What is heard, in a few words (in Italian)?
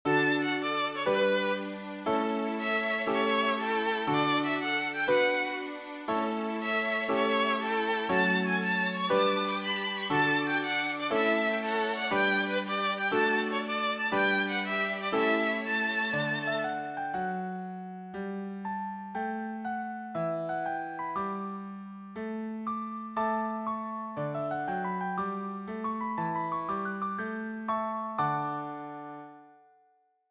Violino